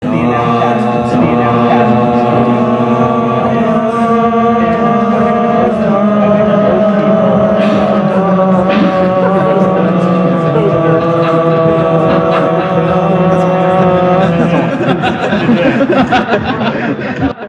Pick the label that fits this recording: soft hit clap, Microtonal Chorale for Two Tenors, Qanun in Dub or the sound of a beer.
Microtonal Chorale for Two Tenors